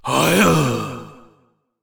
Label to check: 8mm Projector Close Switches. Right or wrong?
Wrong